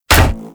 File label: Full Draw Shot, Weapons; Warframe; Infested; Cernos